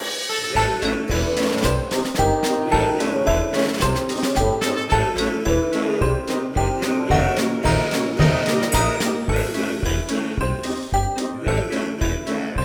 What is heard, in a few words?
Zombie Carnival; Rush2 Zombie4